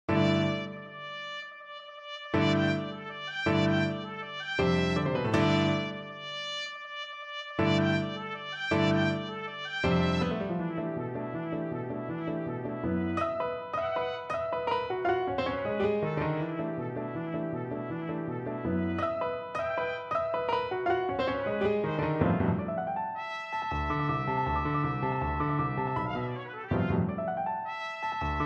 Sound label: trumpet piano